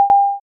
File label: android ta da; ringtones; sms